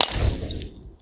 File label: molly splode, molotov; gmod